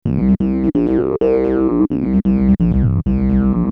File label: synth bass